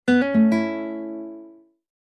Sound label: huawei harmonyos